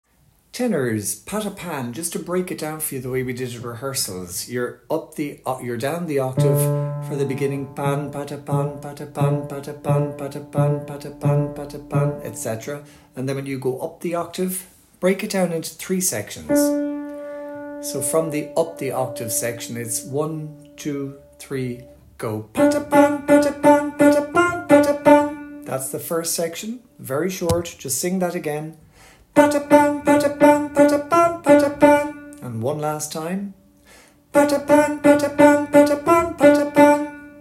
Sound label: Patapan breakdown Tenor